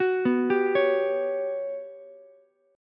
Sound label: G Major Sharp 11th G down Ukulele C6; GM#11 arpeggiated